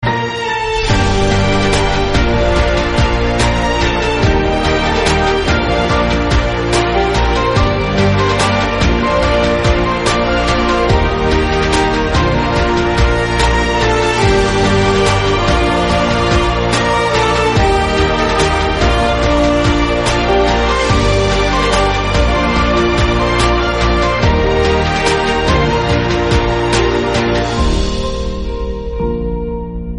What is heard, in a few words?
Flute Ringtone